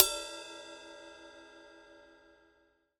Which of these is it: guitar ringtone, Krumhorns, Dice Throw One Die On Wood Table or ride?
ride